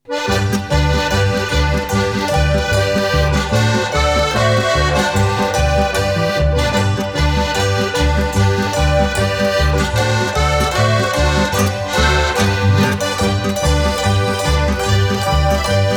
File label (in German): Schafbergpolka